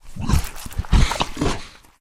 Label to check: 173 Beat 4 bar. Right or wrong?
Wrong